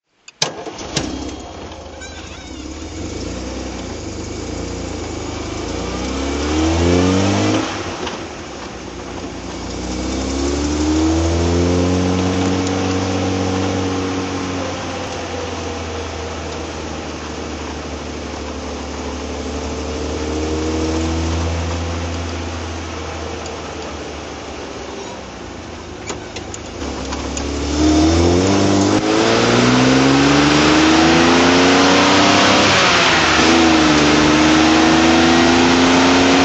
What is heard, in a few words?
austin healey 1006 drive